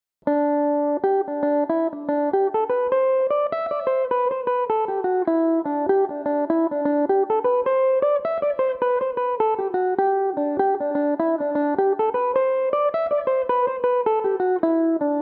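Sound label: G major, Irish mandolin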